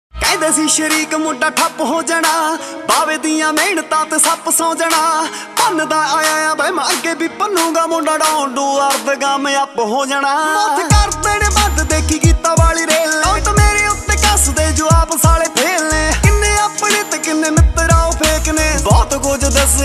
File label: Desi Punjabi Ringtone